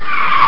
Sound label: Screech